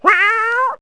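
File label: short meow; Wired for Sound Pro CD; Aristosoft, Inc.; animals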